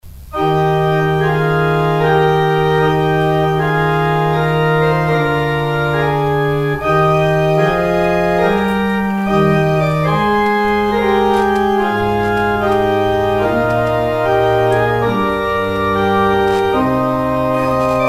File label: organ outro; Organ exit music